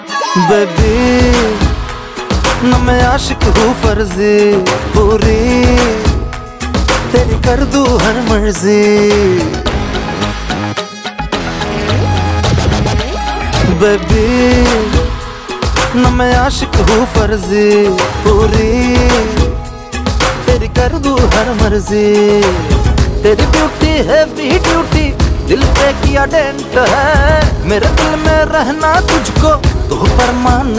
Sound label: Romantic Hindi Song